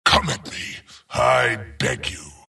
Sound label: death long; dota; skeleton king